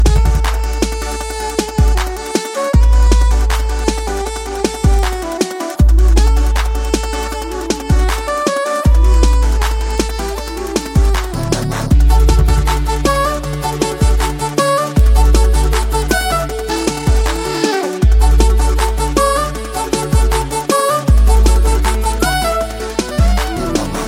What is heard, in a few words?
BG Tone